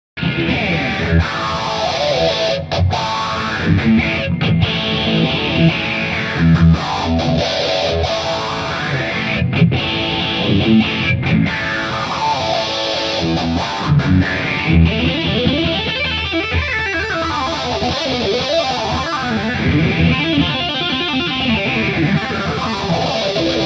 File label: rock riff